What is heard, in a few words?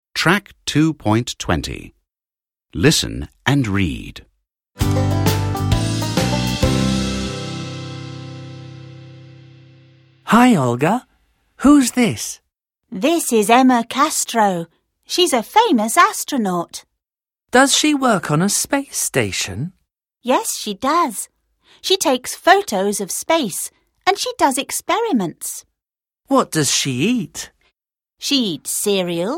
Beep